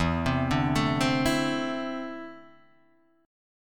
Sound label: D 7th Suspended 2nd, chord